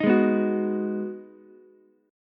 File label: Ab Minor 7th down Ukulele; strummed; JGuitar